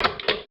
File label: button; HEDZReloaded